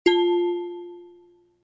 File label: ding